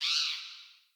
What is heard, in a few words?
Bird Impact Chirp; Pothia Bird